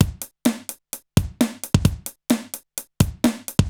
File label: Alesis HR-16B (130BPM), beats